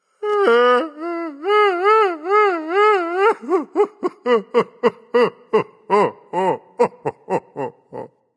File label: boohoo; smite; ra